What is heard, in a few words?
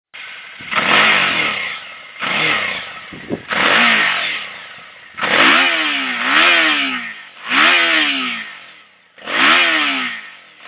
suzuki vstrom abs sound